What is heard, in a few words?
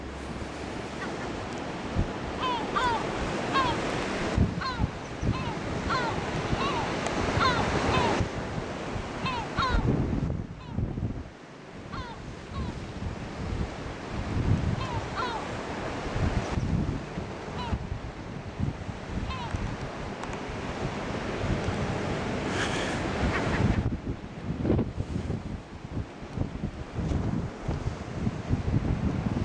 atlantic yellow-legged gull ad mosteiros rally anxiety calls grey wagtail calls